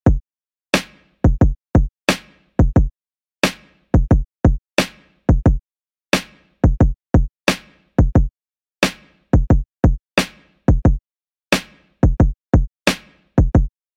Funk 89Bpm

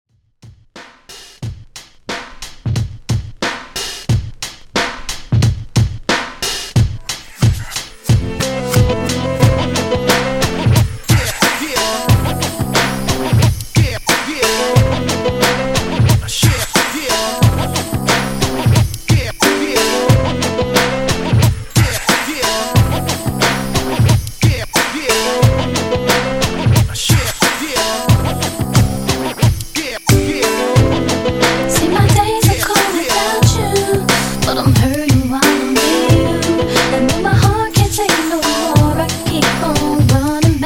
RnB Old Skool Re Drum 90 Bpm

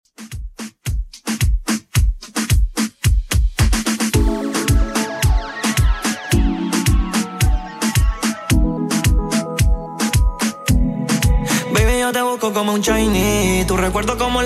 Top40 Reggaeton Redrum 110bpm

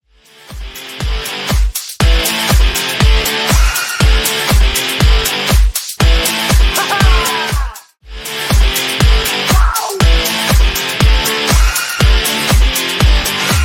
120 bpm; Re-Drum

